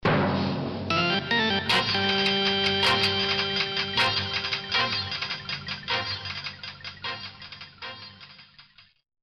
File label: jingle; 1967